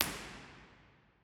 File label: binaural, lady chapel st albans cathedral